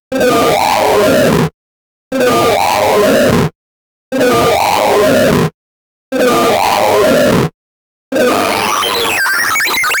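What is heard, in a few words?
tinfoil wraps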